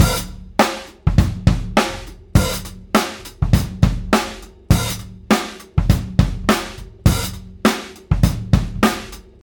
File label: 102 bpm drum loop sample g key, Free drum loop - kick tuned to the G note. Loudest frequency: 1345Hz